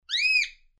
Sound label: cockatiel